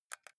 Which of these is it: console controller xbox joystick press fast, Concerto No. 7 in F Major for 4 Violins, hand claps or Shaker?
console controller xbox joystick press fast